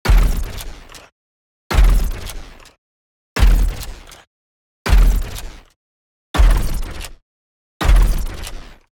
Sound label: Creature Heavy Mechanical Footsteps, Robotic Creatures; DavidDumais; Robotic Creatures Sound FX